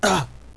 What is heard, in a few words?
player pain